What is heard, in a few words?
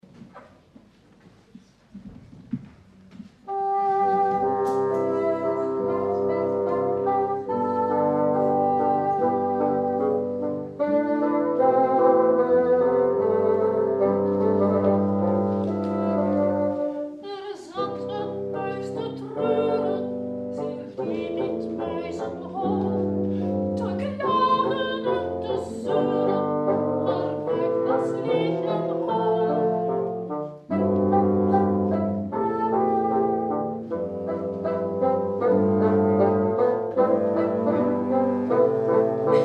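soprano 4 fagot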